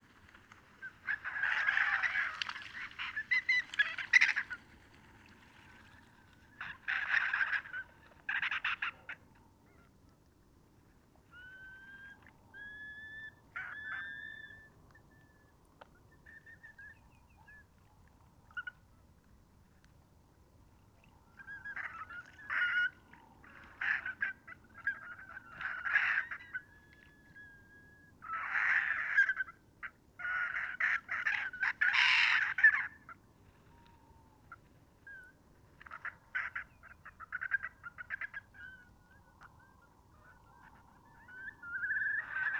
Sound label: gruff